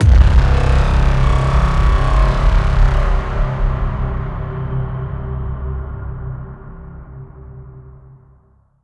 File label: Freespin Intro Splash; mercy of the gods